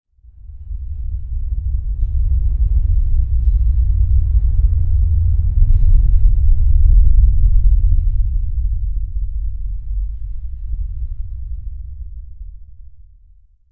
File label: random rhumble